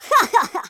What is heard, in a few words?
laugh; Worms3D; Surfer